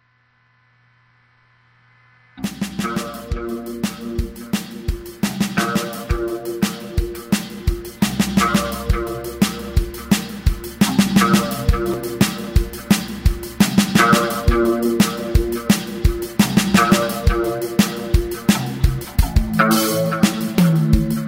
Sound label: from the albuowing the atmospheric soundwmv